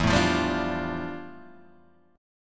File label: F Major 13th E, guitar chord